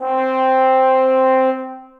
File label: Trombone Section Legato; Ableton; Orchestral Brass